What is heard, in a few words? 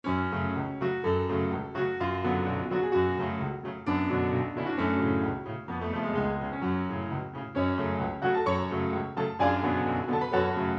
Sync Wavetable Soni